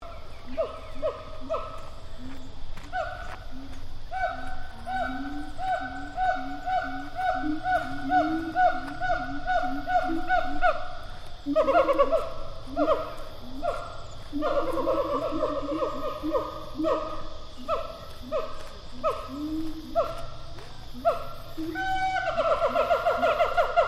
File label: Animal Gibbon Siamang pair call distant, Gibbons in a zoo habitat; Monkeys; Animals